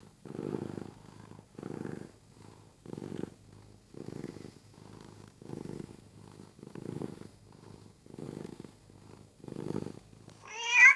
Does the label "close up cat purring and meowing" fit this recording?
Yes